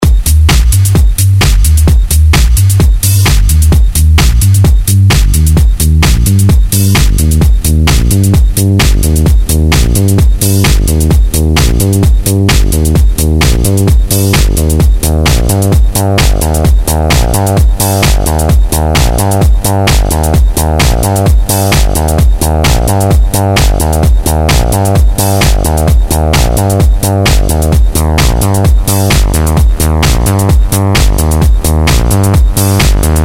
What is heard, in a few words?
arp 2600 saw